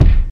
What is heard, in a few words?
studio steel kick drum sample a sharp key, Royality free kick drum sound tuned